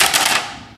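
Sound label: physics clipboard impact small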